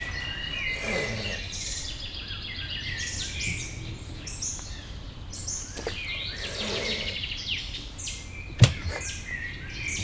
harc a betolakodo ismeretlennel szemben, mocsari hiuz; miskolci zoo 2018

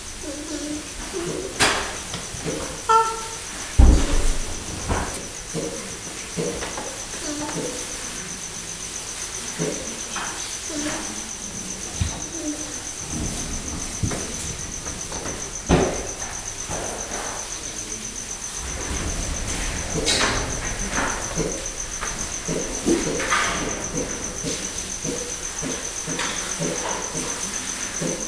a szalmában teknősök mozognak, gyűrűsfarkú maki; jaszberenyi zoo 2016

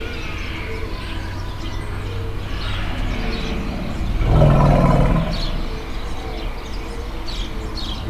halk morgás, oroszlán; állathangok; nyíregyházi zoo 2010

